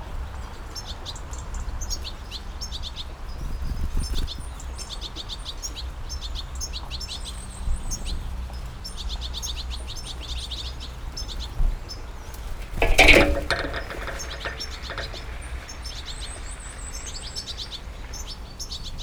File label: Amplified Bird Feeder Longshaw Center Burbage Valley UK